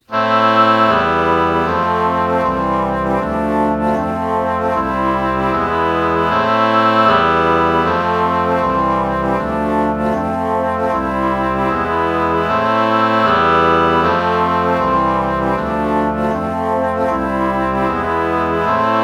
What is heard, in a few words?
alto flute oboe chord morph